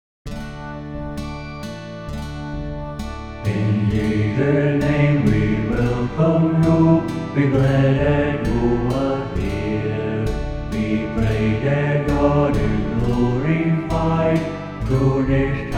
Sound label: Welcome Song (SATB); New Hope Music